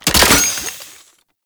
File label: Grenade Launcher Reload; Weapons; Scaldra